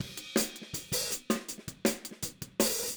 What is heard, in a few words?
Hi-Hat, 162 BPM; Drums; Drums from the Big Room The Mixes; Take Your Toms Off; Verse